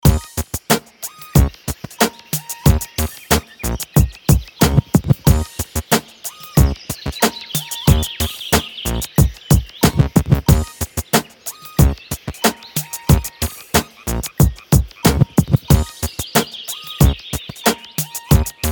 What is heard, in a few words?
loop; retro detonation; greenland